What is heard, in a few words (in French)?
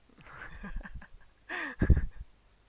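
rire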